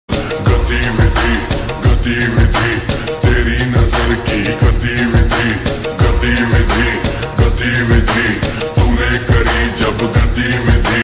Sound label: lofi remix hindi ringtone